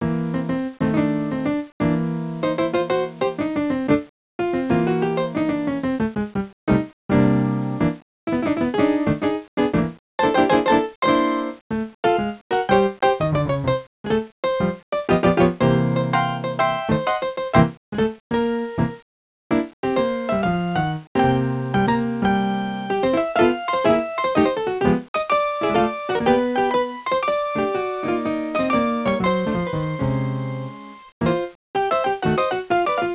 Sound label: Gun jazz; gunman